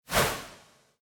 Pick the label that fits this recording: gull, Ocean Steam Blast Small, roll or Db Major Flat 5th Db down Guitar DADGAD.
roll